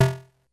Snare, Drum Machines, Korg DS-10

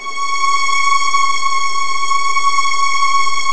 C#3, Virtuoso Strings; ILIO; Kirk Hunter Virtuoso Strings VOL-1

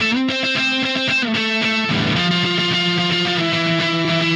Guitar, Indie Rock; Session 01 - Brighton; Sonic Foundry (Sony Creative Software); Indie Rock Anthems

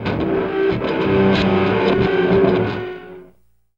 Electric Guitar; Sonic Foundry (Sony Creative Software) - Friendly Fire

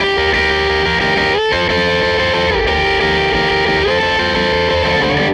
Les Guitar Loop

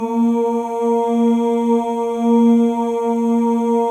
MALE OOS, Symphony of Voices; Spectrasonics

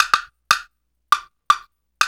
120 Brk Dr Reggae; Spectrasonics; Supreme Beats; World Dance

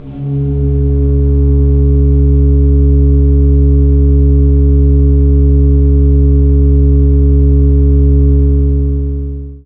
org e2, Church Organ, AKAI S-612 + S-900; Retro Sampler

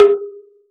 Gu Hi; Roland BK-5; Percussion

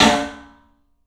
club snare, 3D Drums; 3D Sound

